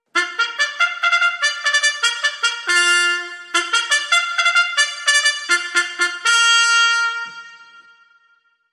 Call To The Races; Trad (PD)

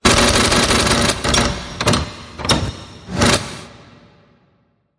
Frantic Door Lock

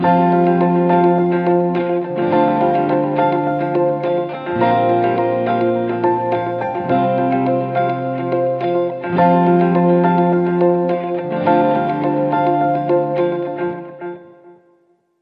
Bright Confident Beginning, No Drums

